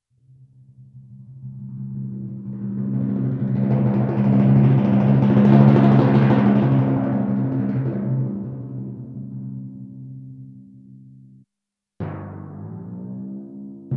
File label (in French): timbales